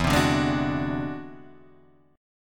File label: E Augmented 9th E down; E+9 strummed; JGuitar